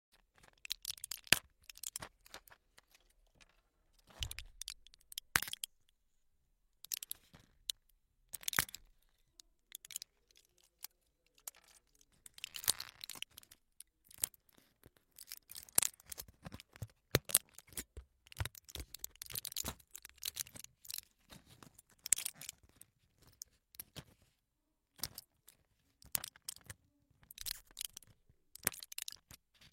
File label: Pills foil package